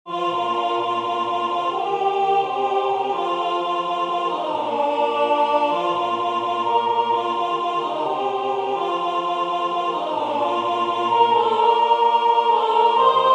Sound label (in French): Venez bergers et bergères alto